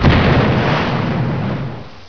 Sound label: cannon